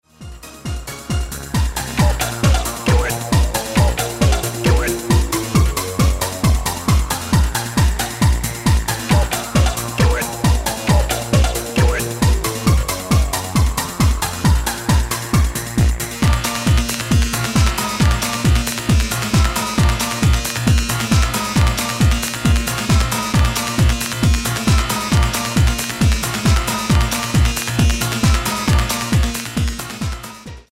slotmachine techno

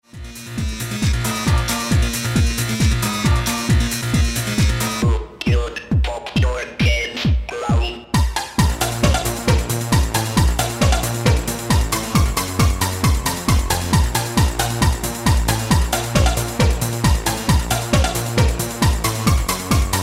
slotmachine short techno

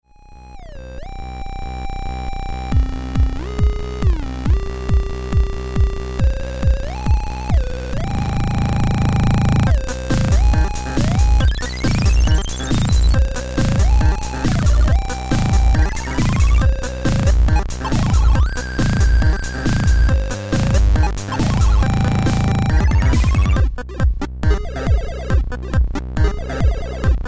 toytone

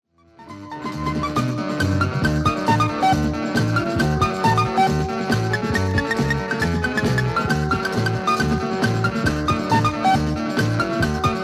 orchestra

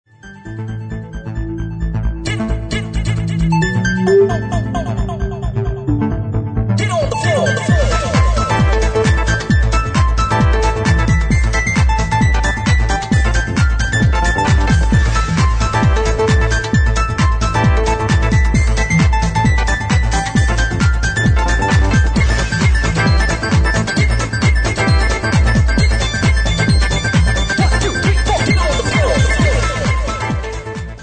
house mix, song

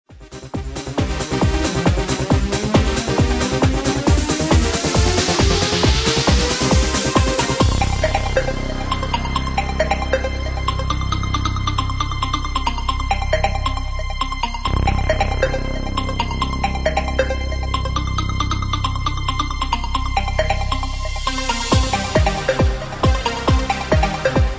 bounce clock

